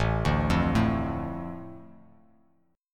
A Suspended 4th Sharp 5th down, chord; Asus4#5 arpeggiated; JGuitar

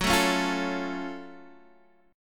Gb Minor Major 7th Gb down Guitar Open D

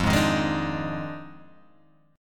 F Minor 13th, Guitar Open G

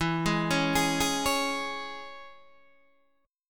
E 6th, down, Guitar, Open D

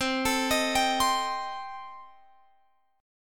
C Minor 6th Guitar Open F, chord; Cm6 arpeggiated

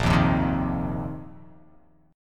A sharp Suspended 2nd Suspended 4th A sharp

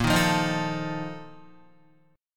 Bb Major 7th Suspended 4th, Guitar; strummed; guitar chord; JGuitar

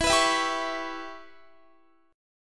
E Minor 6th E, Guitar; Em6; strummed

